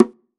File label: Bongo, Roland S50; Drum Samples